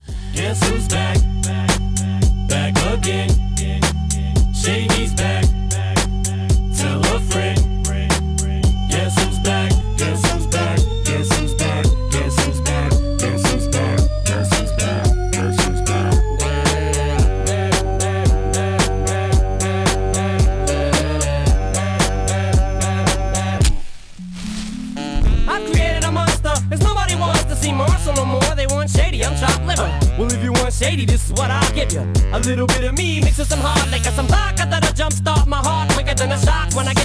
music amb

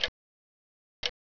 clock; kz ins industryrun

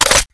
aug clip in

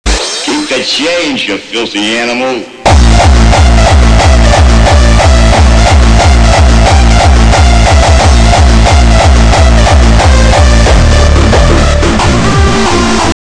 anger

